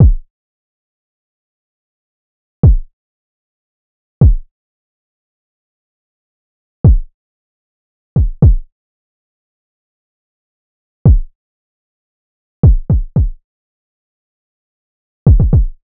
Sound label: Drum Loop - 114 BPM Kick, RnB; City Lights; Cymatics; ANALOG EVOLUTION Beta